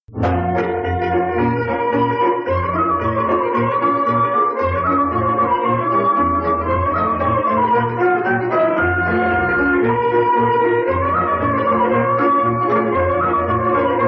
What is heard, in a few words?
Romania Hora Dreapta, Folk Dances; Petaluma; 2nd String Folk Dances; Romanian